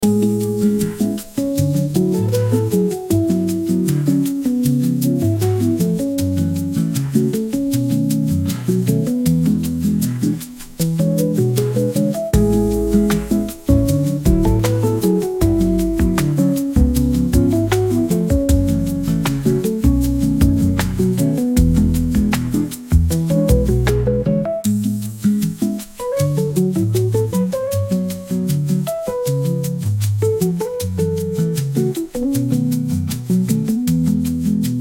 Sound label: Elevator, hold music